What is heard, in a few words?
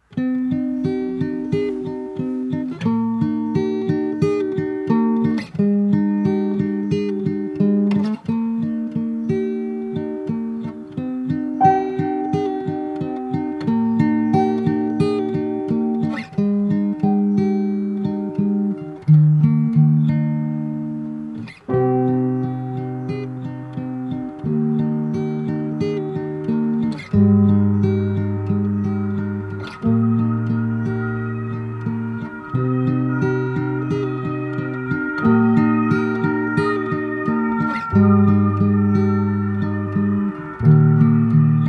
birds Instr